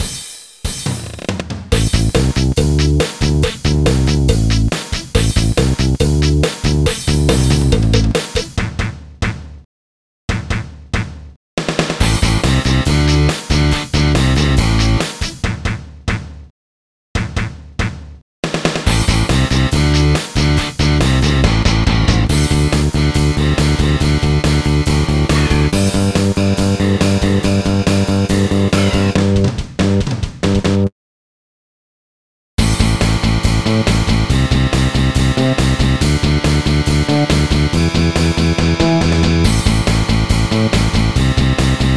Rock Stage Karaoke; pinky street kira kira music night pop town gamerip

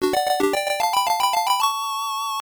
Item Obtain Item; Wonder Boy The Dragon's Trap Game Gear

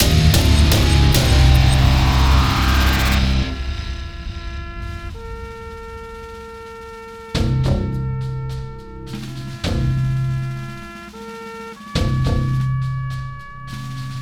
Failure Stinger; warpips windows gamerip 2021

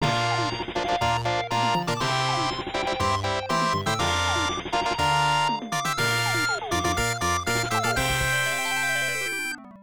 Game Clear Fanfare; Three Wonders; arcade; Don't Pull

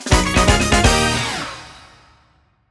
(Jingle) Results Fanfare; Kirby's Dream Buffet

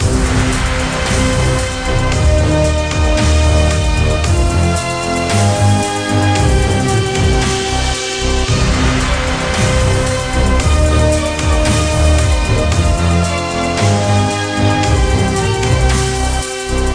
Jingle Level End

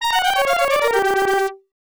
Game Over, arcade; Arkanoid

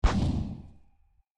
Bomb Destroying; my cute commissar 2020 gamerip

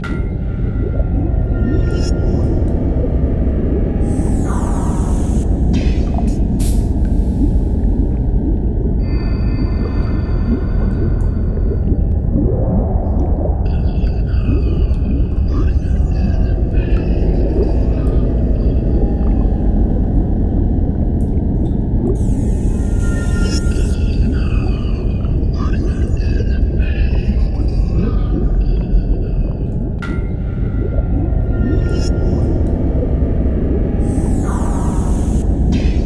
Ambient The Factory; rayman arena

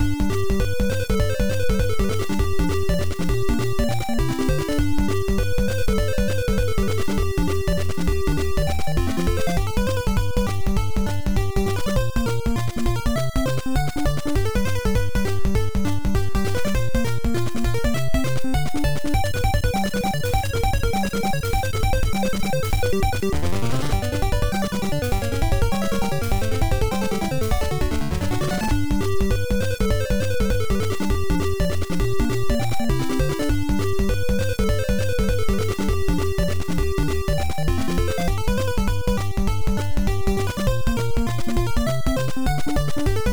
Shooting Stage BGM; Konami Wai Wai World; Family Computer

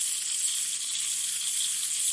Propellerhat Walkman Loop

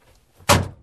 Hood Close; car simulator 2

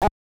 Short Chuckle; Mike Tyson's Punch-Out!!

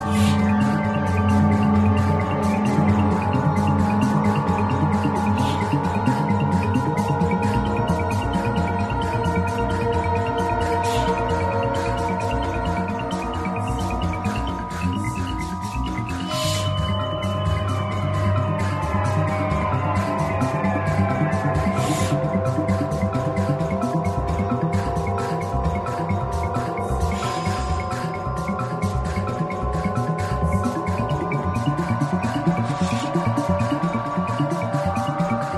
loop Action

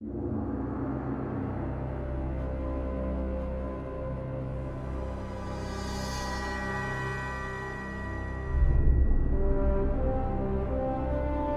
Suspense; The Dwarves 2016 ps4 gamerip

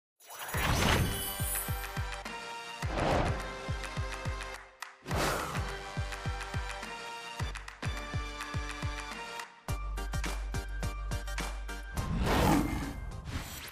Paint Sprayer; Disney Infinity

